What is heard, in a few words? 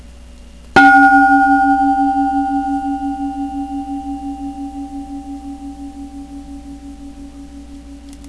large temple gong